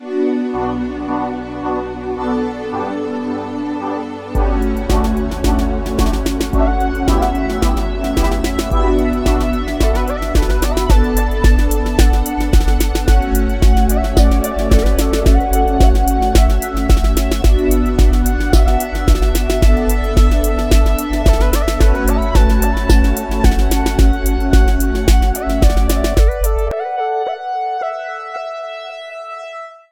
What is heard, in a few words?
Synth